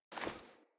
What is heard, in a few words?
Foley Walk Default, Default Clothing; Player